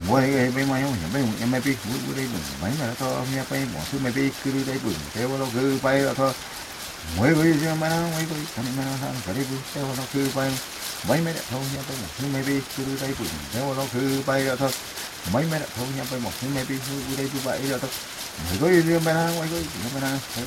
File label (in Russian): zvuki shamana